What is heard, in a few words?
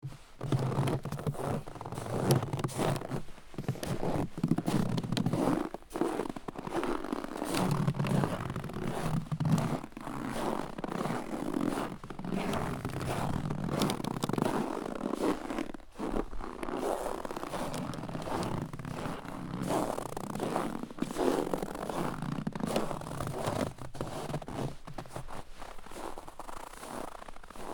footsteps snow; foley